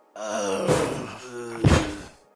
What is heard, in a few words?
death knight dead, monster